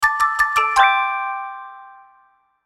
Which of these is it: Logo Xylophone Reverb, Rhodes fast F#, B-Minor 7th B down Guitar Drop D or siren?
Logo Xylophone Reverb